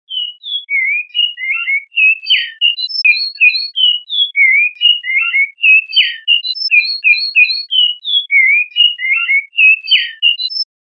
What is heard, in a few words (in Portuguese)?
canto bicudo